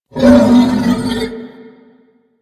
Horse Vocal Screech Stopping; Warframe; Vehicles; Duviri

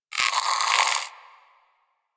Rodent Vocal Snore; Warframe; Hunting Critters

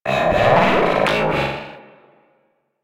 Talking Machines; Ambience; Warframe; Corpus Railjack

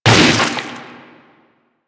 Proto Infested Runner Arm Attack Hit; Warframe; Abilities

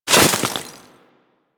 Melee Impact Snow; Warframe; Weapons

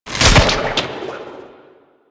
Grapple Rope Break

